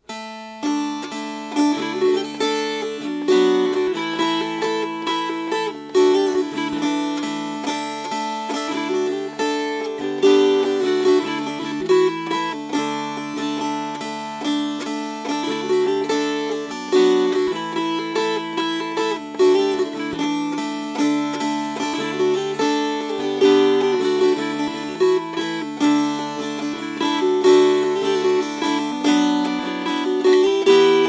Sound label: Strummed